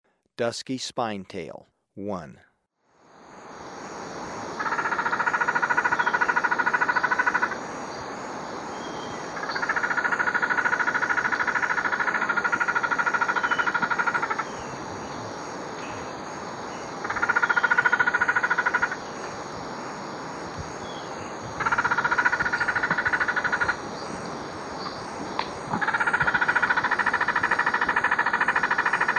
Dusky Spinetail, Birds of Ecuador; S. America

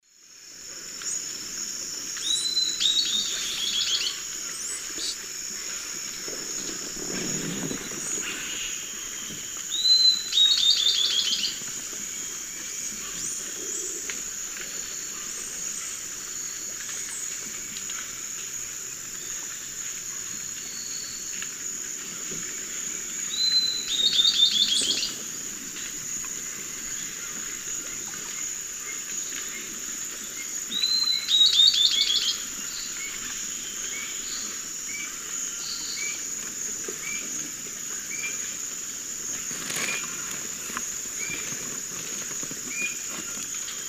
Camptostoma obsoletum, call; S. America; Eastern Lowlands

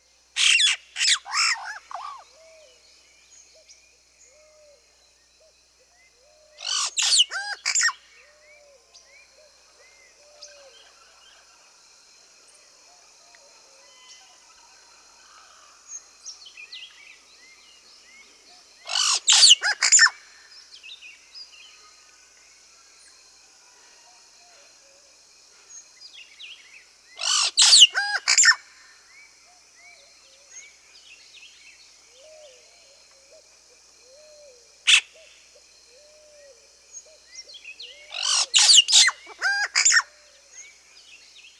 Yellow-rumped Cacique (Cacicus cela), Peru; S. America

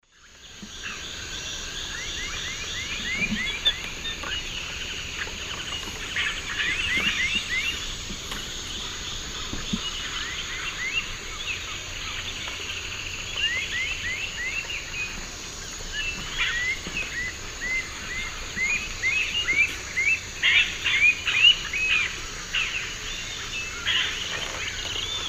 Attila citriniventris, call and song; S. America; Eastern Lowlands

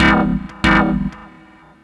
Synth, Techno

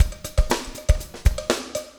breakout 120, 120bpm loops

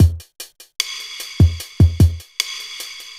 hiphop

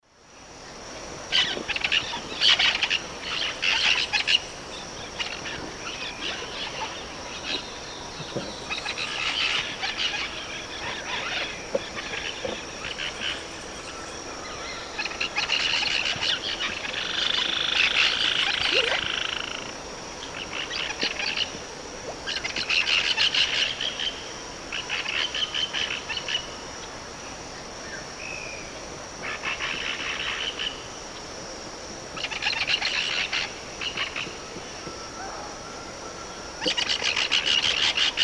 Graydidascalus brachyurus, calls flock; S. America; Eastern Lowlands

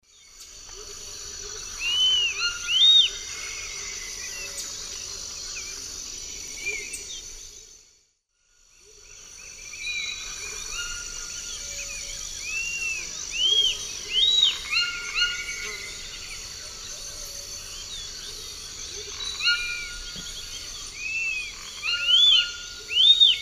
Tolmomyias assimilis, song; S. America; Eastern Lowlands

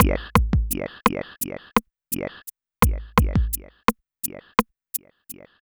Yes Vox; Ambient Cinematic; Vox Loops

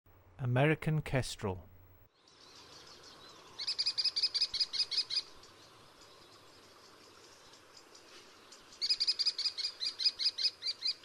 American Kestrel; North America; Western USA; Western USA Songbirds

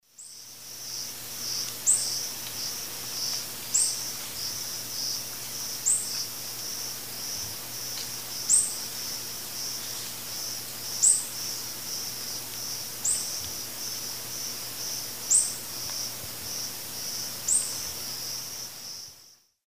Cyanerpes nitidus, call; S. America; Eastern Lowlands

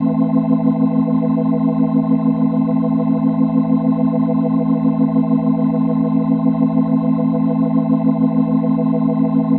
Organ A Min, Rock Organ Licks

